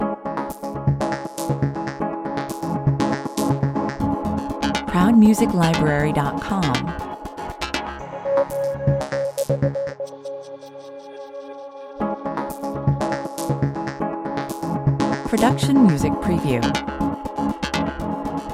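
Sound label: Flat and hypnotical technical ambient on hold loop. Beatless.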